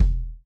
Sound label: Kick Softest; hydrogen drum samples